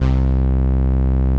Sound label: 505, String Machines